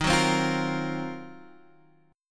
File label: D sharp Minor 6th Add 9th D sharp Guitar Standard, chord; D#m6add9 strummed; 11,9,10,10,11,x